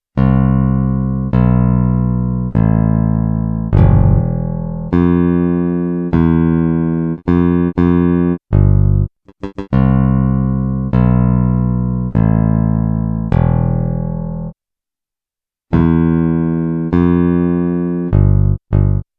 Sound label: composition bass